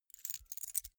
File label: key ring small grab soft